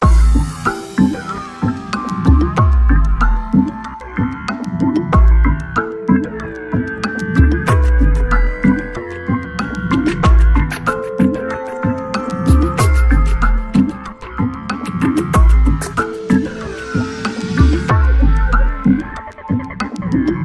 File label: big lead; naught reawakening